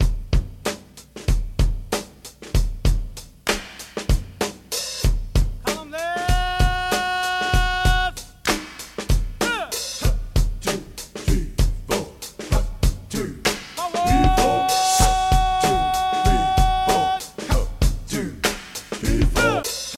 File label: 85 bpm high quality breakbeat d sharp key, kick tuned to the D# note